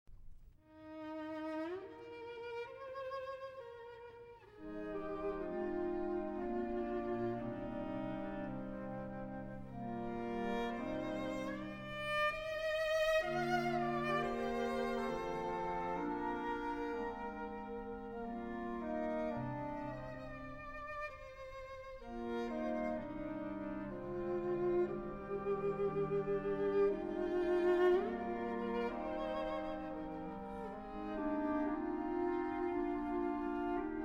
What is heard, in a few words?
Suite For Viola & Small Orchestra Carol